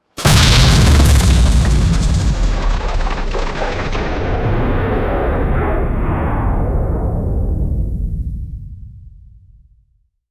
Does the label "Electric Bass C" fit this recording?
No